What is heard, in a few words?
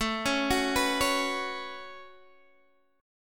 A 6th Add 9th, Guitar, Open G